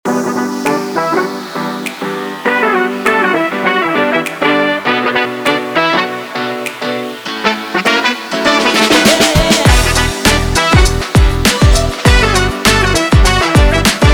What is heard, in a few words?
Sunny Hip Hop Extended Version